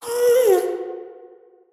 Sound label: moan, ghast